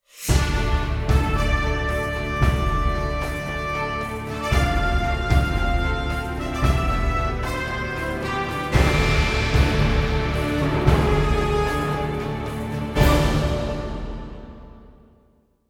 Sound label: stock music